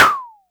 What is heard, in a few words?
cheooo; kidGlitch; Ableton Foundation FreePacks; Wicked Kits